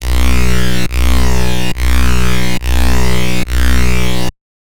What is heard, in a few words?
Bassline, Dubstep